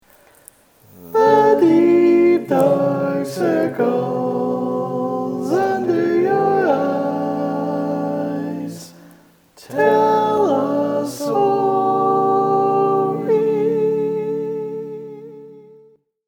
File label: barbershop tags